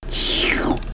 item pickup

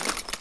items

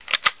clip slap, ump

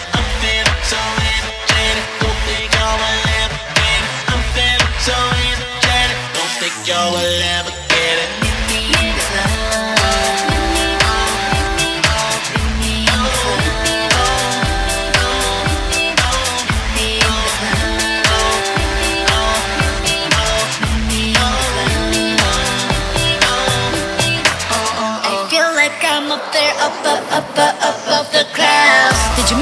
energetic, stereo; carmod

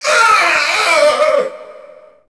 death; mgs; greyfox

